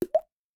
Plop, notifications; Yota Yotaphone-2

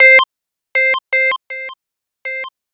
Stinger alert; PlusFourSix

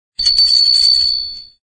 Wind tone; SGH-U800E SoulB

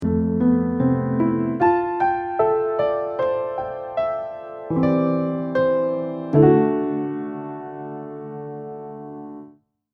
Piano Odyssey, ringtones; ROKiT IO-3D

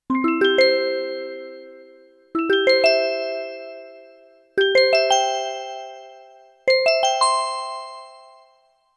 Balloon, notifications

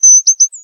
Sparrow

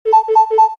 Cuckoo; LG Revolution VS910

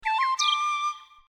Nightingale; LSO; Anima; Vertu; Signature-Touch

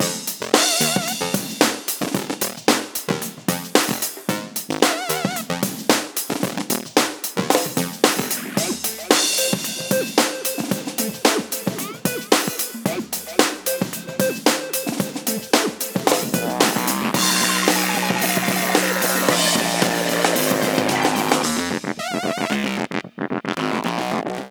Future Funk, ringtones

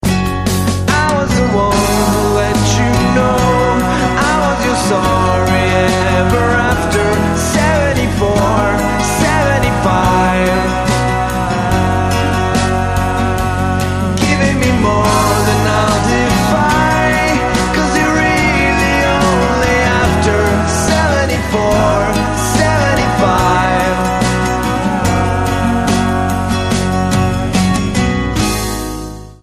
chorus part, ringtones; Arphiola ringtones; Soundalikes and covers

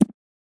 tick; Samsung Galaxy S10; Accessibility

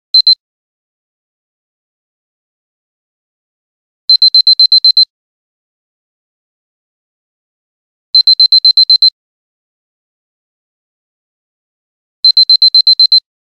Clock (Beeper), Nokia Tones; Windows Phone apps

